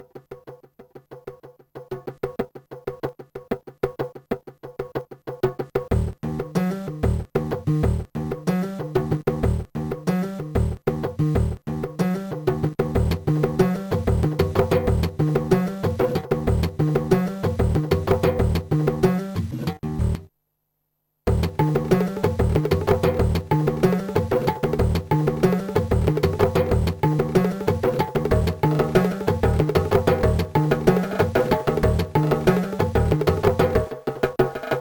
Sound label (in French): basse percussions; Trackers; Modland